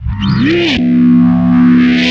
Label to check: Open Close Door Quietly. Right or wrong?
Wrong